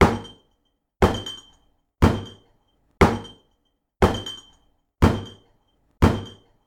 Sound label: Table hit